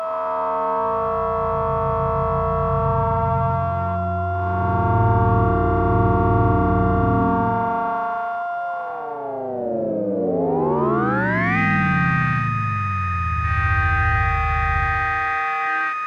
ring noise

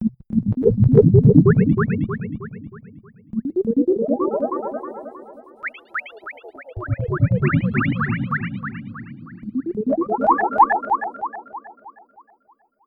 burble arps; chromaris

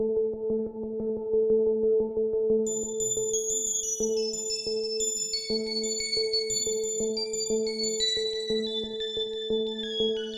amaj arp; chromaris

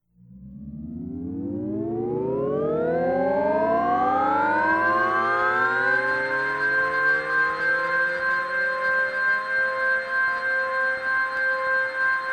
C drone

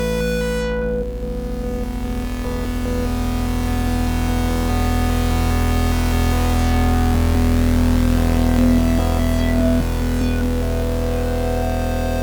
C pulse

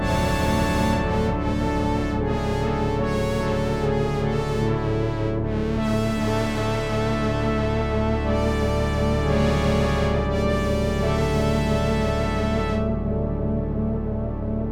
A drone

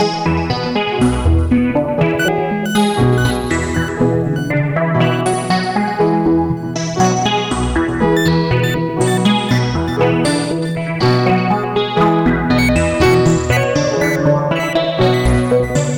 A 120 piano arp

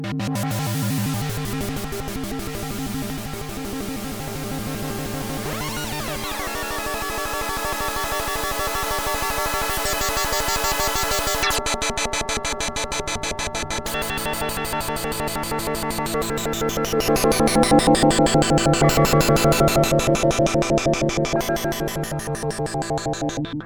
dissonant pulse

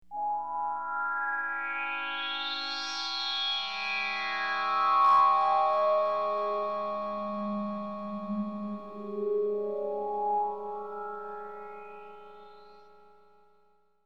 jelly drone

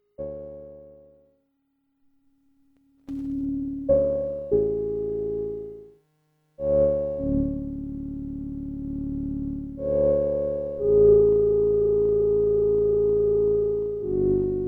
A arpeg

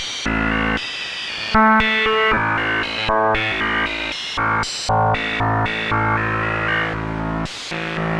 A pulse

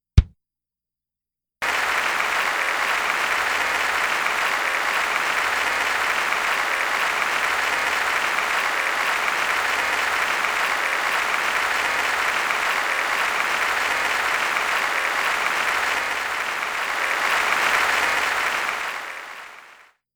applause noise

